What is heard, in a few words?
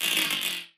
bullet energy titan shield spang cluster